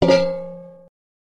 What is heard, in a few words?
frying pan impact